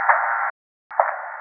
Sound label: tick tock sounds, lithotripsy